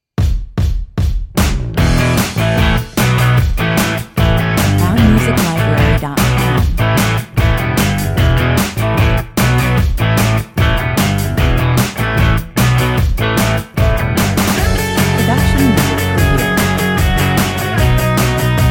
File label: rock 150 bpm